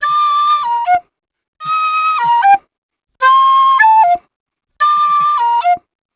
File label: Penny 075bpm Bb, Penny Whistle, African; Quazibeat; African Secret